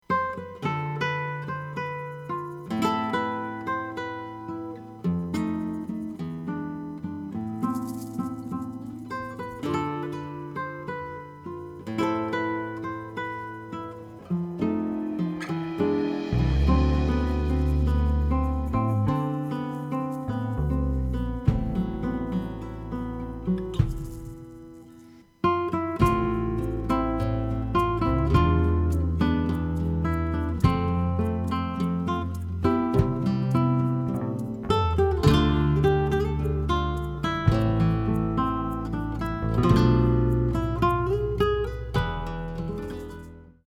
solo guitar

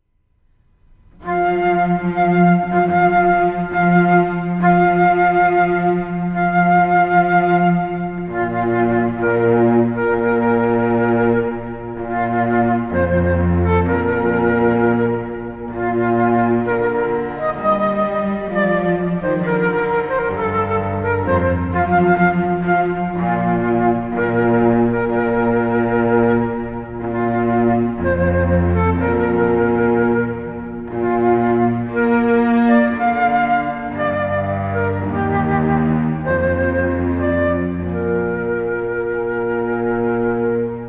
Bridal Chorus, Duo Flute Cello; Wagner

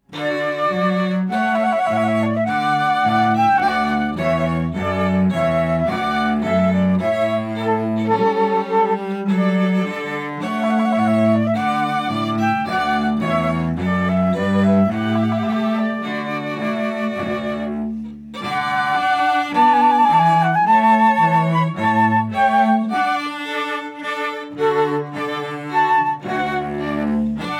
Trumpet Voluntary; Clarke

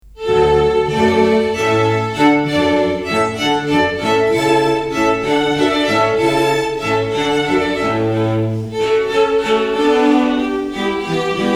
Hornpipe Quartet; Handel

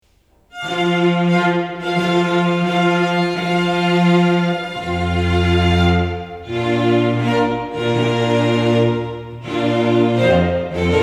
Bridal Chorus Quartet; Wagner

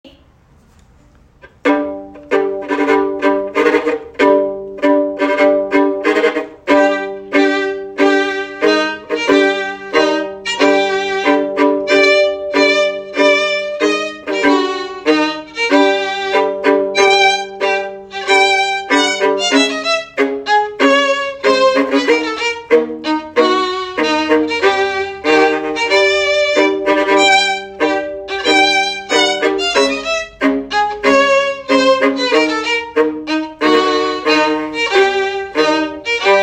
two violins

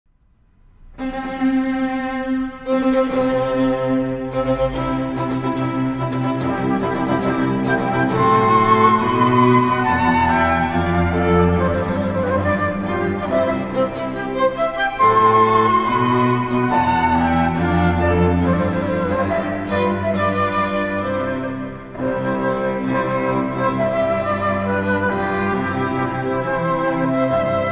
Wedding March Trio; Mendelssohn

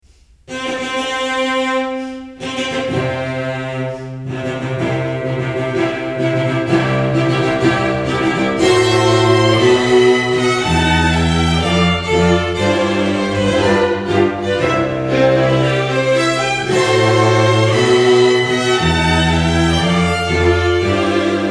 Wedding March Quartet; Mendelssohn

